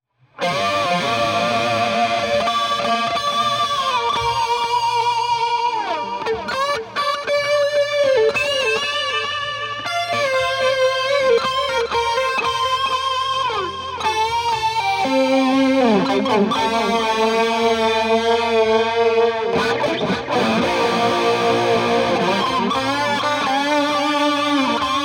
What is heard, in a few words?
solo No BT